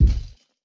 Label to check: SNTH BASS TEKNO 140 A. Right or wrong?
Wrong